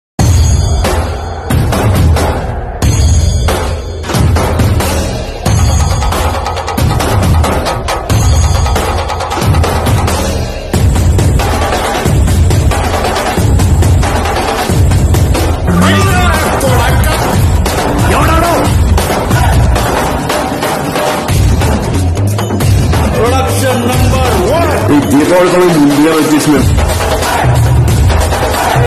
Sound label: attitude bgm ringtone; bheemla nayak